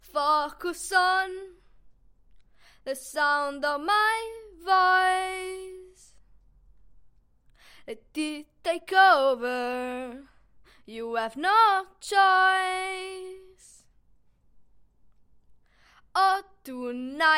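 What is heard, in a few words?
pop, 120, B minor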